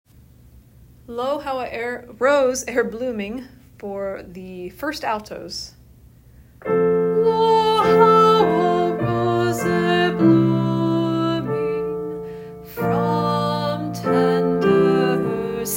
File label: alto